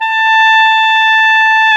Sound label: clarinet; Sound & Vision - Gigapack I CD 2 (Roland); Brass&Woods1